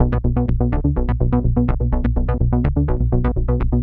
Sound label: Moog arp; Sonic Foundry (Sony Creative Software); Underground UK House & Electro; Bass